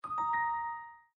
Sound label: Print complete; Cityscape